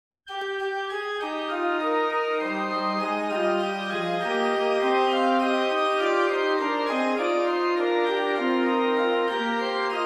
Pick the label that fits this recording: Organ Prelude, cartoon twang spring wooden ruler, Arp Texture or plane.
Organ Prelude